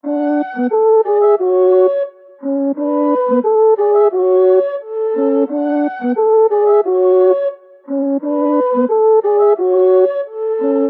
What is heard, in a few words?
88 melodic loop flute andino Gbmin